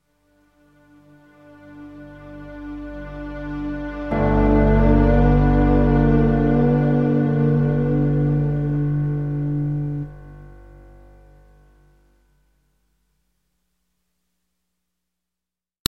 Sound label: landscape